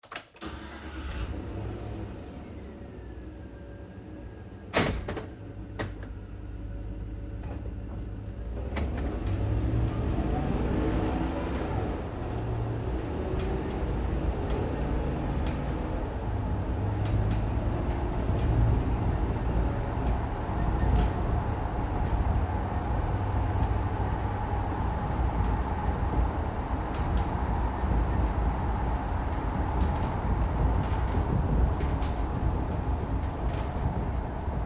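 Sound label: Truck GMC Savana interior Drive Accel Decel Medium; Trucks; Big Room Sound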